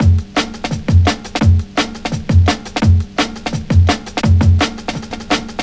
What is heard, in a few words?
Drum Break, Drum & Bass; Fluid Dynamics